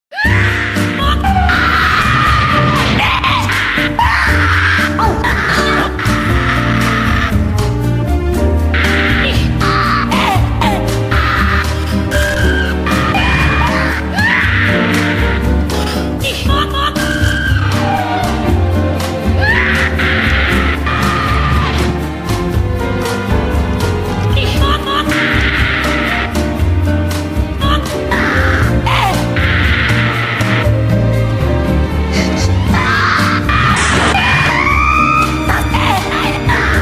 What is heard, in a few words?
cry; sven; sharktopia